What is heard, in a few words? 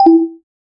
ui sound for an education, feedback